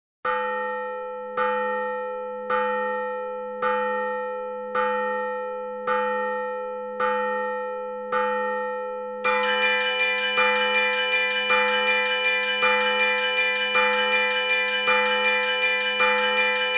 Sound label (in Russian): 9 kolokolov; svyatogorskiy